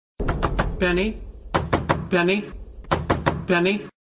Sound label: knock ringtone; sheldon cooper knock; english ringtones